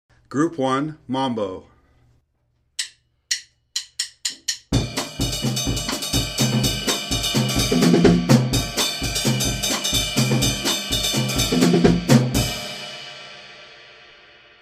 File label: mambo